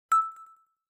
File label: btn click; bookworld